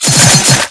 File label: CHASING BREAK